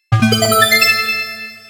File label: music hibell, notifications; Samsung GT i7680